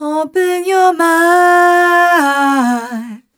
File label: f mel e flat, fem Vocals